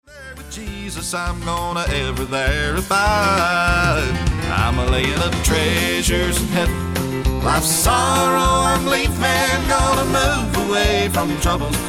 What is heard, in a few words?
Gospel Contry Up